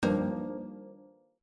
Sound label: chime